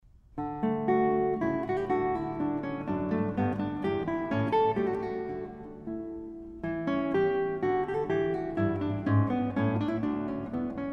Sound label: Cello Suite No1 Menuets I and II, fragment; Johann Sebastian Bach